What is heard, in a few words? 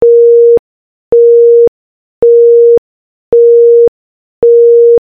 Tone